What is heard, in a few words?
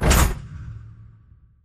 sliding door ext verb close stop; Titanfall 2